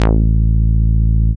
bass; E-Lab; X Static Goldmine 1

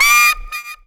SAX RIF

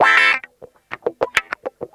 GTR

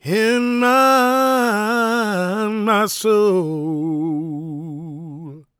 GOSP MALE, Vocal Planet Gospel; Spectrasonics; Vocal Planet Vol.1 Gospel; akai

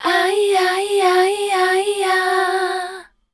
#F, Voice Spectral; MELODIC; Best Service; Voice Spectral II

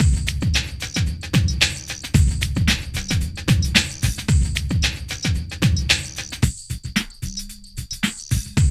PHAT LOOP; E-Lab; X Static Goldmine 2; akai

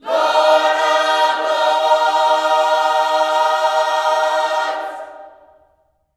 LORDS, Gospel; Spectrasonics; Vocal Planet Vol.1 Gospel; HALLELUJAH

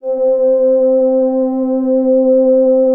Atmo Pads; Best Service; XX Large

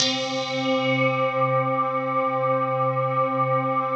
PAD, ATMO PADS; Best Service; XX Large

